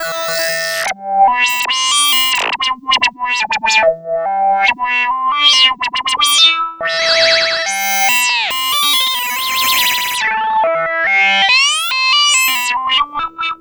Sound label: Bpm141 Lead Loop Em, Dubstep; Serotonine